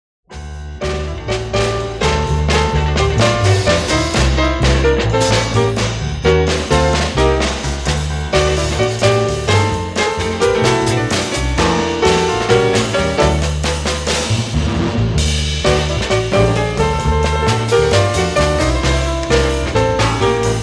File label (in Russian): instrumentalnyiy kvartet